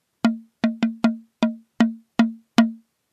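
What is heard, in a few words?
tutu drum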